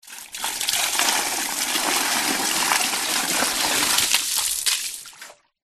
industrial cannister large plastic tip pour empty water into drain